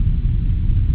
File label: thrust